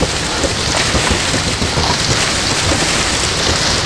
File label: roll mulch, terrains; Tony Hawk's Project 8; XBOX